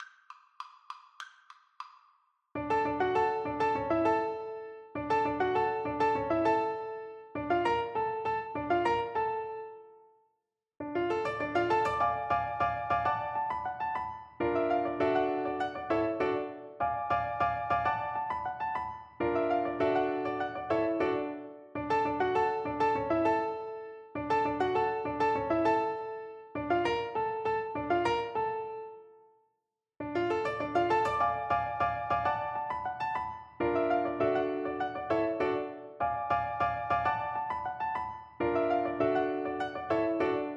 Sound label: maple leaf rag, Piano Duet